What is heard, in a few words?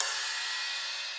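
open hi-hat; eddyflux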